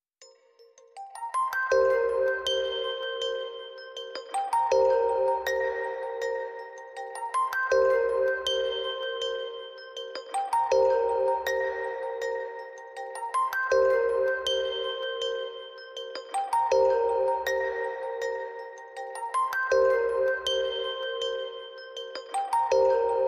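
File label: Arpeggio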